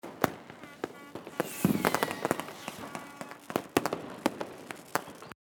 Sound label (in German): Silvester Knaller Funkenregen Rakete